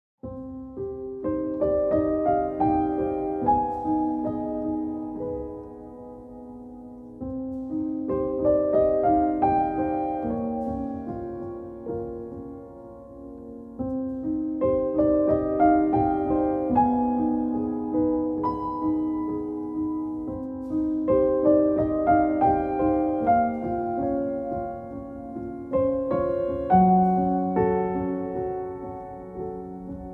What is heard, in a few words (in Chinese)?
地下室